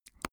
teeth clack smack; soundpool

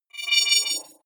wobble swell shimmer; sweeps-swells

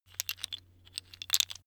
tiles wood clatter; objekte; holz

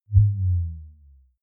bass drop simple short

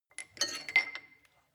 weight bolt pull out; sport freizeit

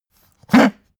pug gag smack short; natur-tiere; hunde

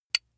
small metal impact medium high; industrie wirtschaft; metall

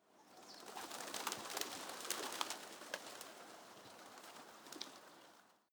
pigeons flutter; natur-tiere; voegel

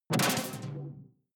sci-fi alien bounce detuned bright

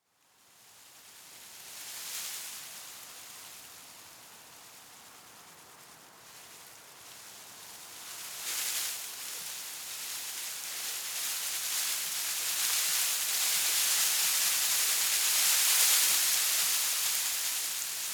shrub tiny leaves rustle heavy; haus-garten

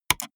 gaming mouse left click single fast hard; maschinen; buero

